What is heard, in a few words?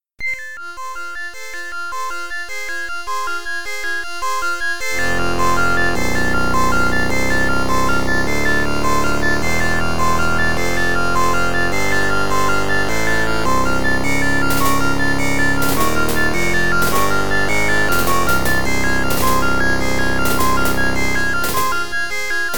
Detonation Game Over; Space Quest 1 VGA; DOS